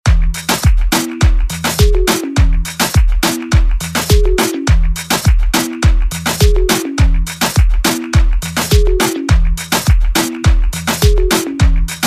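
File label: Afro Reggaeton Redrum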